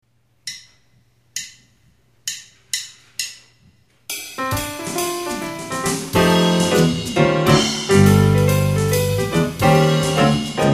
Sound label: bach jazz